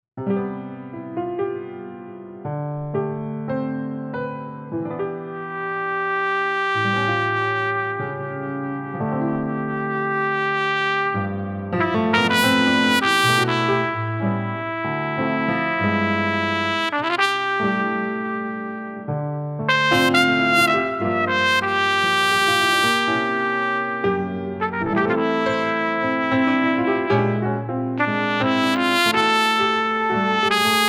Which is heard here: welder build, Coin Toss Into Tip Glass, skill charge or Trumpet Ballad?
Trumpet Ballad